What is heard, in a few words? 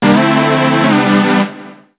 Shift Change